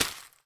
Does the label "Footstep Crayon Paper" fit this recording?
Yes